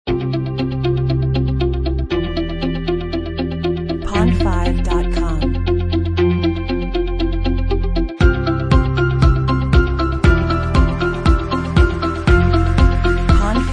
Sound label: optimistic and upbeat